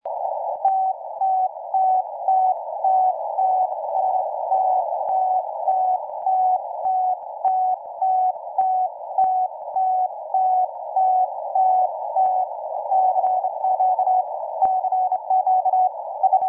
14MHz Sputnik

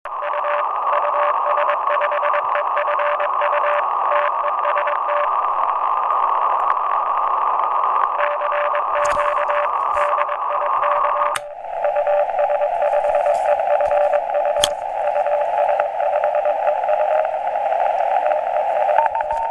DIY

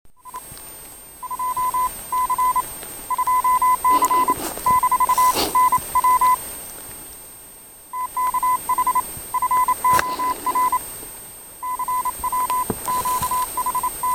10mHz

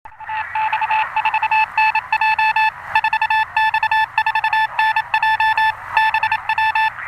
RX Home made

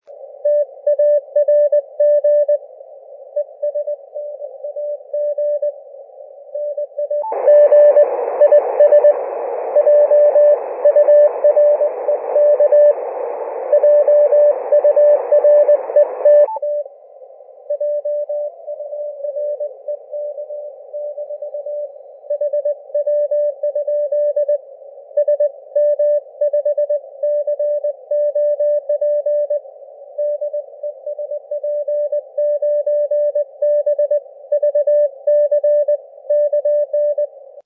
80 CW 0.4 2.4kHz

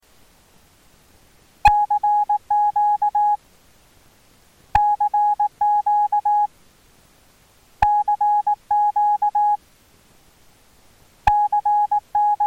RX agc out res

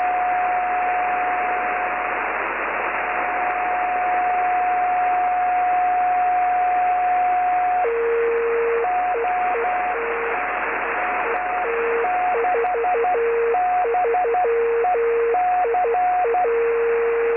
DFCW; OY6BEC

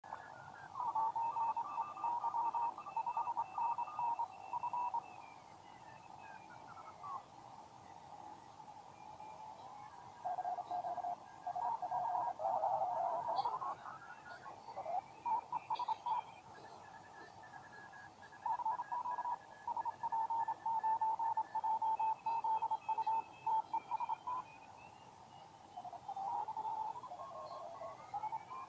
regen 40m

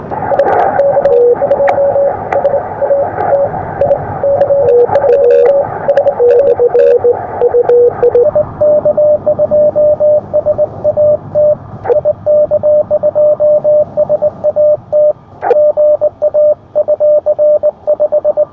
20m

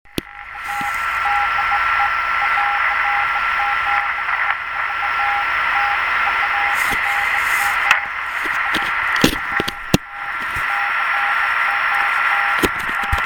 QRP CQ

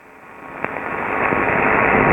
echo

